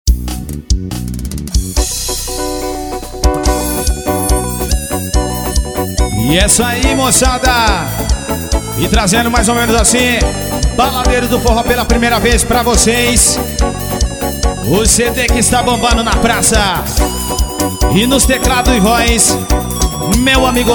abertura

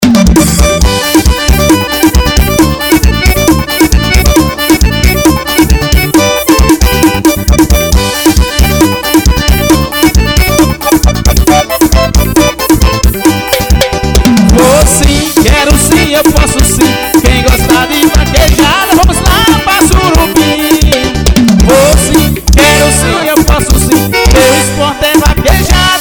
promocional ao vivo no bar; bar do rogerio

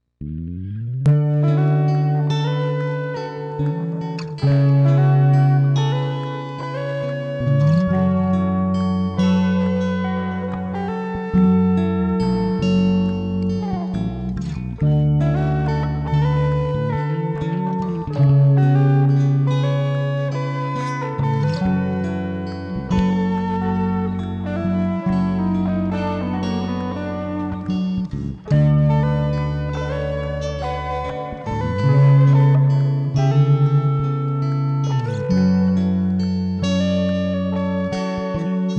solinho de teste pro projeto instrumental

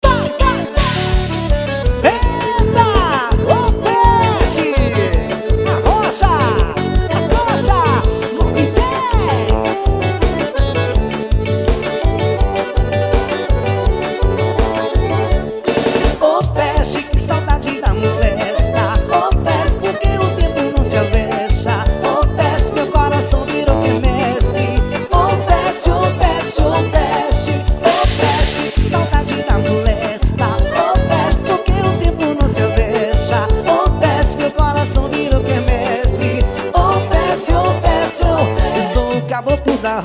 arrastapé ao vivo no forró

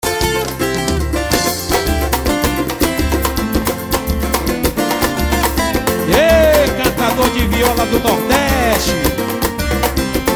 viola de doze viola cantador

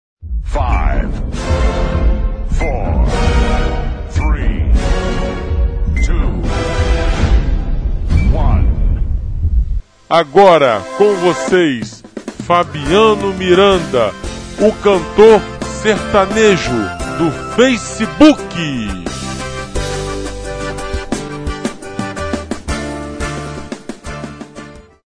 introducao

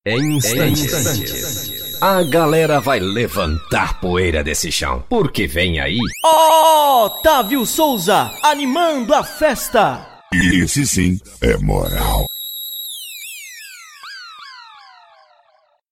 vinheta de abertura

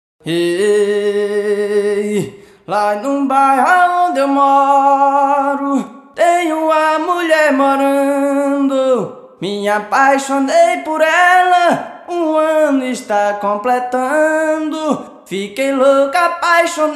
toada

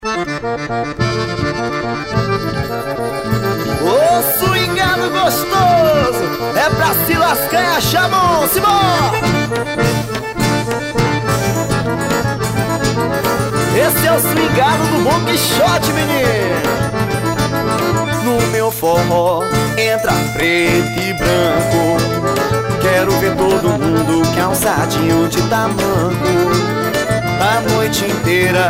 forro de preto branco

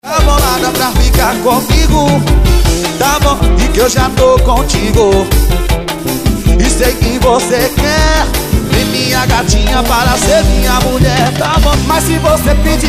swing

